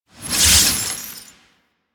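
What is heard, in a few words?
Explosion Cage Dissolve; Warframe; Warframes; Khora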